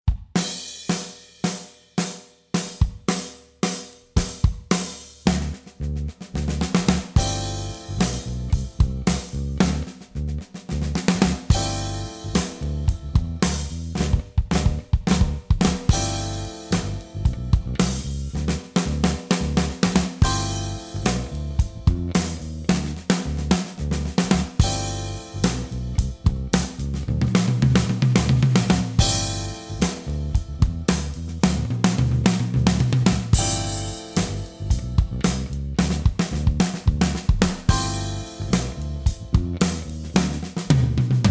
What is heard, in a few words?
Pop, Drum Fills; Essential Drum Fills; Erskine